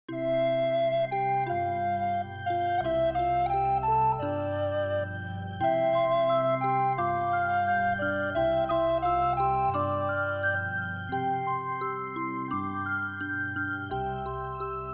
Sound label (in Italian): base musicale; stella stellina